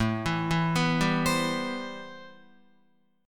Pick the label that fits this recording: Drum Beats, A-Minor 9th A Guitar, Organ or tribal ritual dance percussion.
A-Minor 9th A Guitar